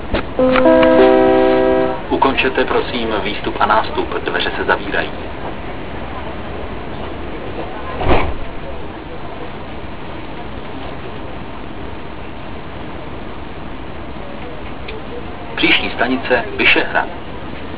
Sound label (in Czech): odjezd, metro